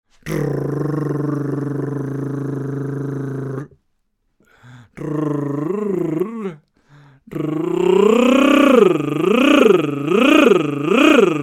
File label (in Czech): cerpadlo